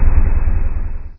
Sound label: train brake